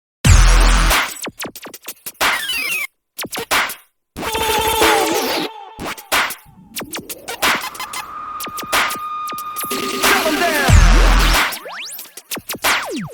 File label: Popping Beats, percussive pops